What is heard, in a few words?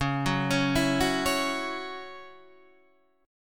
C sharp Minor Double Flat 5th, Guitar DADGAD; 11,9,11,9,9,11